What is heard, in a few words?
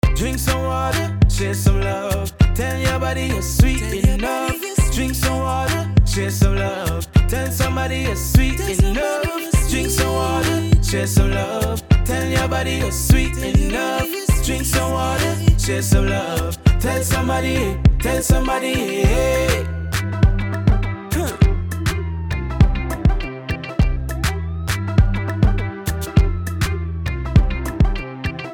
ringtone loop; You're Sweet Enough campaign